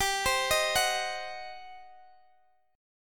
G Major 7th Suspended 4th Sharp 5th, Guitar, Open D; x,x,17,18,18,16; down